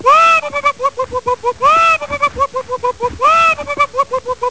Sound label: wuwuwu